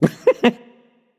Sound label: heh heh